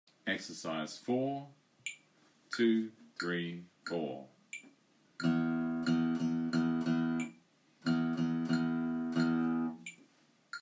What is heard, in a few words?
Rhythmic; Grade 3